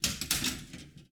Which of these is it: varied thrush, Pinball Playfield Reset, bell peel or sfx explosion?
Pinball Playfield Reset